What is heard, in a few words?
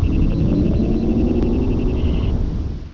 Pwrup; gemtrek